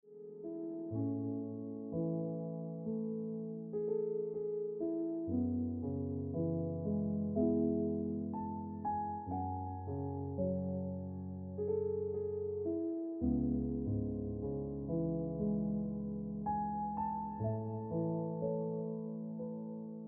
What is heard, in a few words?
Ringtone Piano Music